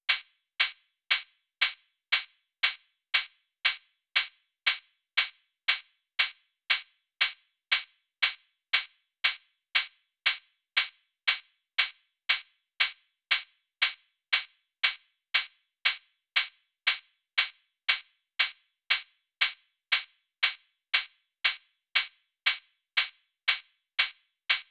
Drum Loop - 118 BPM Cymbal; Loop Stems; Cymatics; Pacha Drum Loop

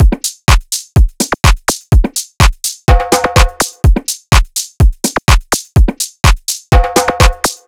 Drum Loop 125 BPM; Fever Dream Drum Loop; Cymatics

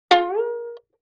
Violin Pluck Pitch Rise

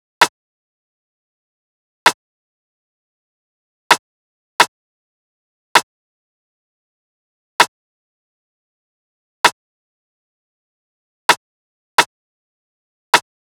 Drum Loop 130 BPM Rim, Trap; Cymatics; ANALOG EVOLUTION Beta

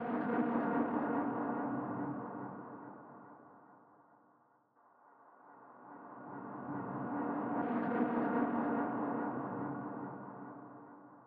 126 BPM D# Min FX, melody loop stem; Trap; Loop Stems; Cymatics; 2022 Melody Collection; Trap Pt 1; Cold Feet

